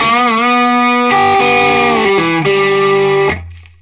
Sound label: SOLO G, Vintage Blues Guitar; USB Soundscan vol.22